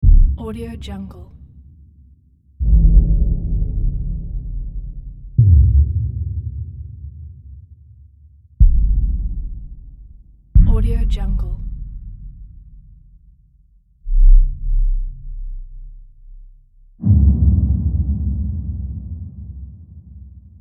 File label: impacts collection